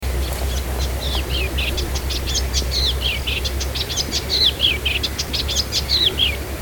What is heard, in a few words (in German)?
blassspoetter